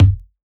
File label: Plastic Box, household rhythm samples Hits